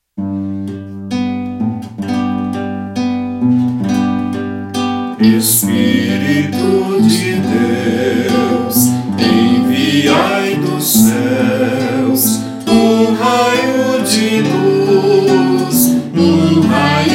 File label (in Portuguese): sequencia